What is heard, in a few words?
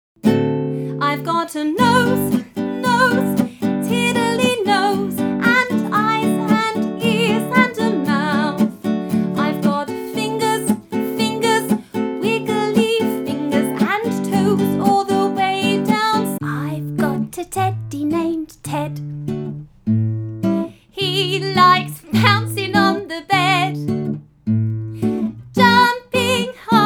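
songs for early years guitar vocals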